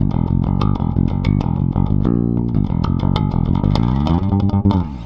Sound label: Rock, Bass; Bass X